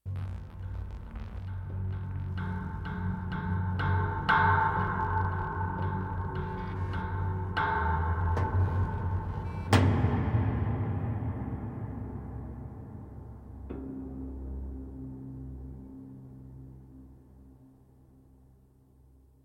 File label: mood; inFamous; PS3